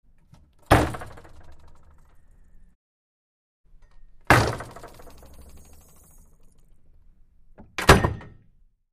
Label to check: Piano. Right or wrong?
Wrong